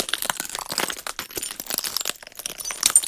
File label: charge, Magic, Cards